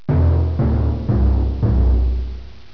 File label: timpani